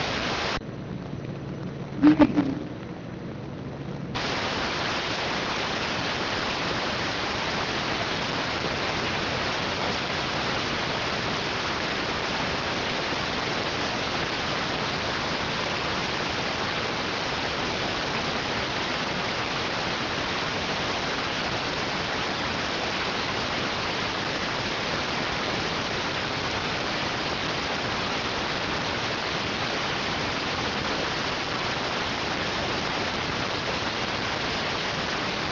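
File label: radioteletype receive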